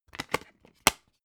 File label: dvd case put in dvd